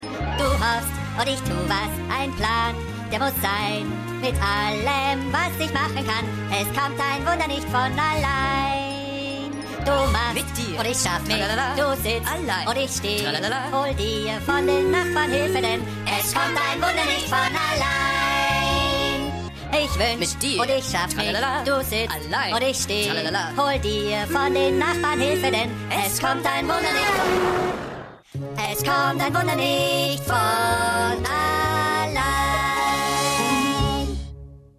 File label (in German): Gesang